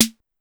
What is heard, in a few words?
Snare Electronic Lo, Drum Machines, Clavia DDrum AT, Pitched Up; 300 Drum Machines